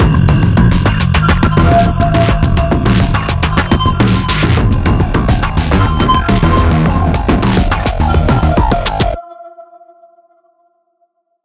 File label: Fight Low Combo; Remember Me